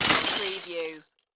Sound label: Break Glass